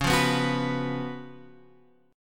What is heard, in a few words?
C sharp 11th C sharp; C#11 Chord {9 8 9 X 7 7} strummed